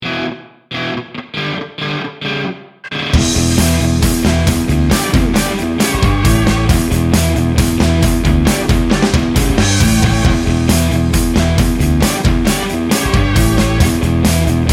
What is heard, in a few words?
party power